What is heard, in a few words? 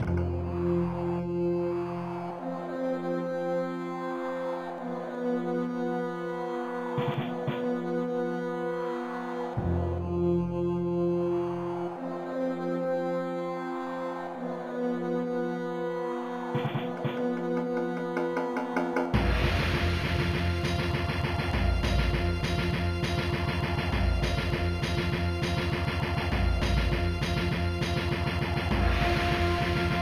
Boss Loop